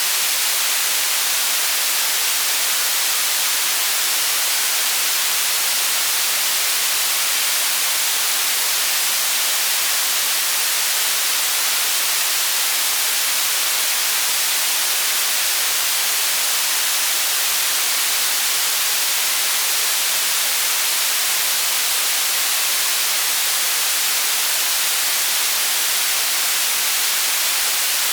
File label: rain; rain level 3